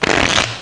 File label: SHUFFLE